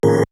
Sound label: Stabs, Elektron MachineDrum; SPS1 MKII